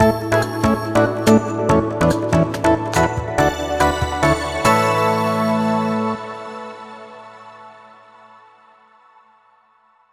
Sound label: Picross Completed; Picross S3